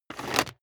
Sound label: vacuum cable pull in short